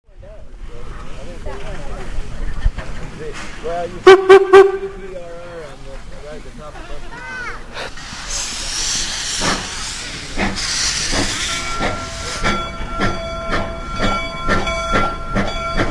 train

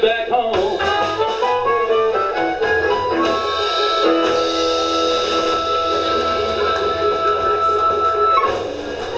ending harp

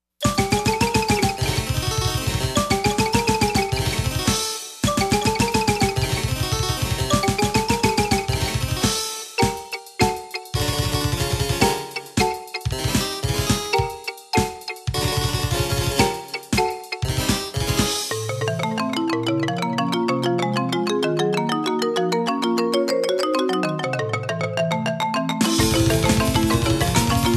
True African Black Metal

